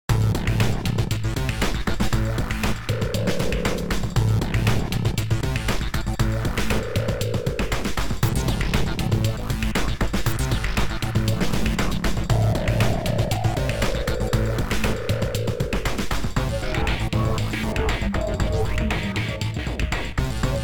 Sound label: Dissonant Goth 118